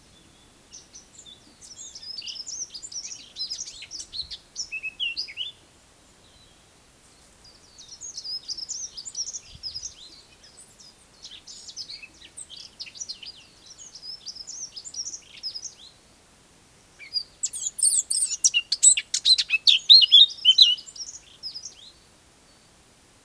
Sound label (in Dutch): Heggenmus Prunella modularis